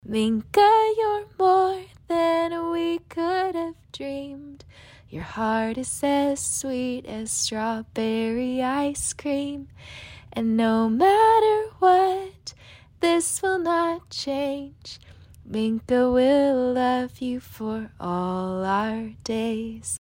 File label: Acapella Song Chorus